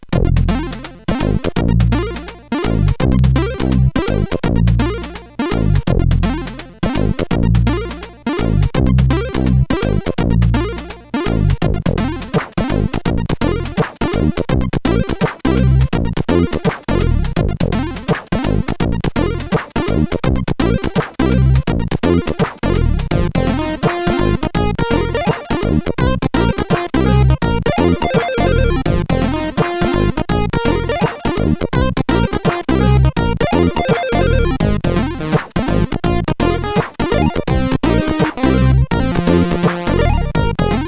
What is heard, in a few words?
CSG8580; Pigsty